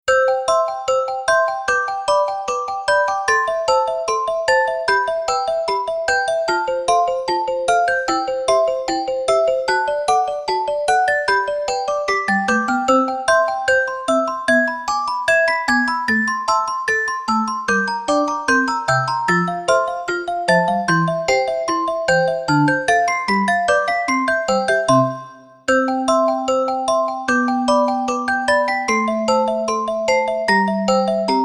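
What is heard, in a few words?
Celesta, pianino cyfrowe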